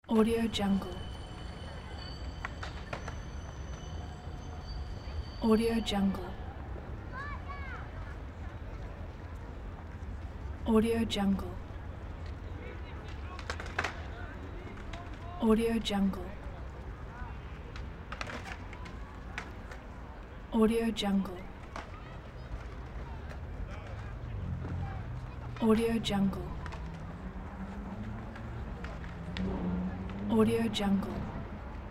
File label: city ambience